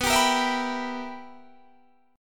B Major 7th Suspended 4th Sharp 5th Guitar Standard, chord; BM7sus4#5; strummed; 19,22,20,21,20,19